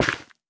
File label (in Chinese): 菌岩破坏声